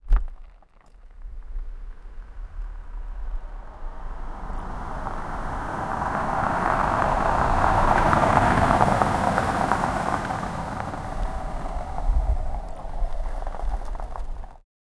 the car is driving on

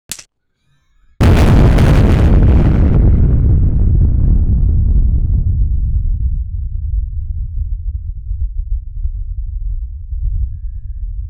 create a sound of missile, eruption

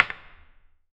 a single extremely heavy, crate

